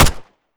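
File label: papa90 fire player, weapons; gmod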